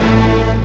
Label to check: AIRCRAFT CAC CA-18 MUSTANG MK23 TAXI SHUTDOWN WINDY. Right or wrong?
Wrong